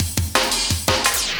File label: loop, Drum & Bass, 170 BPM